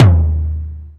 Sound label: Tom DMX; One Shots; Drums